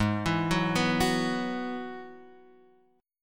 G sharp Minor 7th Sharp 5th, Guitar Half Step Down